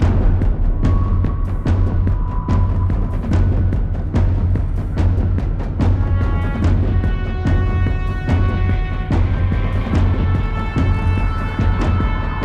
Combat Medium Threat Loop

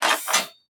Sci-Fi Weapons-Loading Fx

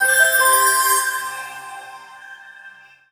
Hint; fate of hellas

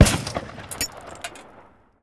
Weapon Sniper Rifle-Fire

